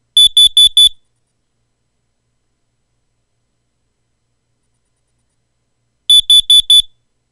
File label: carbon monoxide alarm four beeps